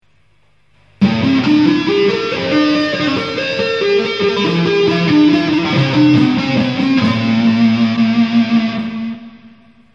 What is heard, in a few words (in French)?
exo tapping harmonique